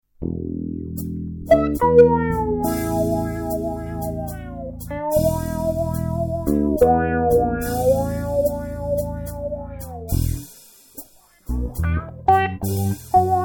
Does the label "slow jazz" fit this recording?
Yes